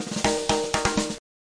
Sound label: 120 bpm snare intro; drum loops and breaks; fancyguy